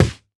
Foley Footstep Hard Sole Dress Shoe Skid On Hollow Wood Surface; Foley Footsteps